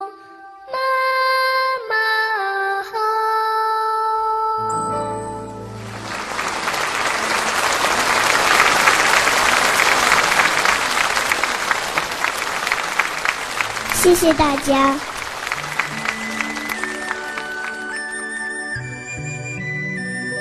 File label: chinese sad